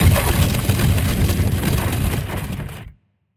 earth magic large; 魔法効果音

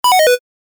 cancel

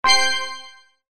break and chain

